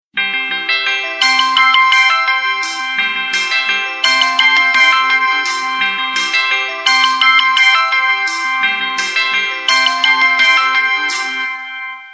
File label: jingle; Samsung; SGH-T379 Gravity TXT